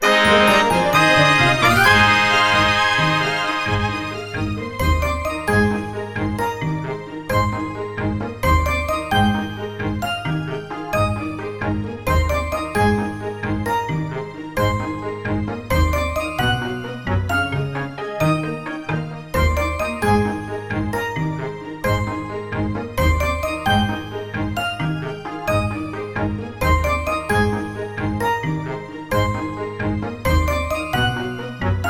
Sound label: Handbell Harmony; Perfect Score!